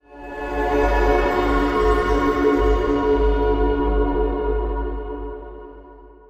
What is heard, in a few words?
Menu Intro elemental Good High Shimmering Pad; ascendance